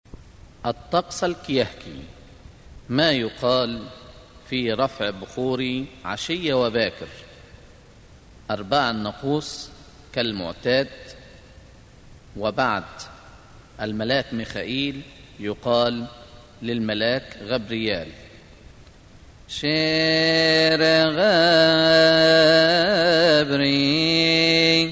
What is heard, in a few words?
Verses of Cymbals; أرباع الناقوس شهر كيهك; Kiahk; رفع بخور عشية وباكر; Ⲭⲉⲣⲉ Ⲅⲁⲃⲣⲓⲏⲗ; مكتبة الألحان; كنيسة الشهيد العظيم مارجرجس بنزلة السمان